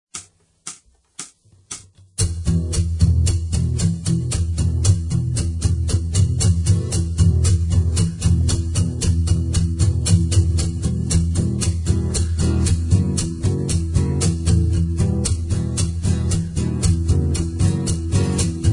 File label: Acoustic